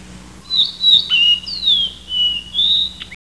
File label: ping sound